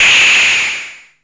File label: WN FM HIT, ANALOG PERC; Zero-G Ambient 1